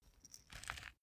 small pepper grinder glass ringing; industrie-wirtschaft; gastronomie

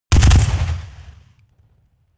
rifle ak-47 exterior fire burst bass

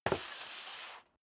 step sneaker metal dry slide long; schritte

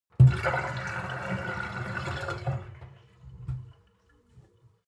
sink filled pull plug; industrie-wirtschaft; gastronomie

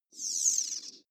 sci-fi power off fast; swooshes-swishes

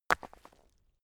stone midsize drop leaves

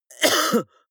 cough male single; koerpergeraeusche

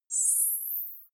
zoom out, signale

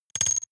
noise metal hits splutter; maschinen; signale; soundpool

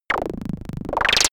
wobble; comedy-cartoon; soundpool

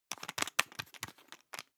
toothpaste screw cup close fast hard; Bathroom; haus-garten; badezimmer

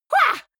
goblin fight shout attack; soundpool

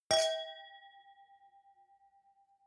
wine glass different clink soft; berufe; gastronomie; soundpool

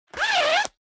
zipper down jacket open fast; objekte; reissverschluss

